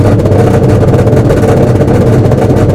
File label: PITLIMITER; AOS F1 2022; FSR2018